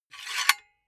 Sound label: silicone gun empty pusher pull out fast; berufe; handwerker; soundpool